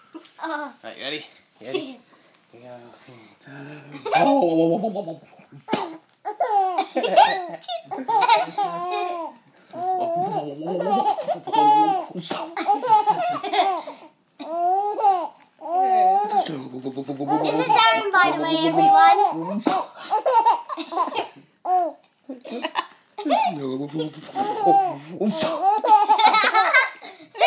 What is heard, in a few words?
laughing